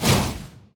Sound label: enemy warlock ranged cast